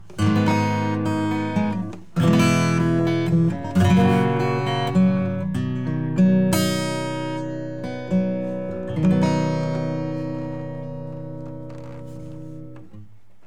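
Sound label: Funk Guitar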